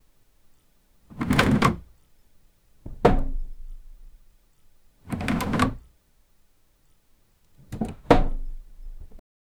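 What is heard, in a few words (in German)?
Holztruhe öffnet sich; antique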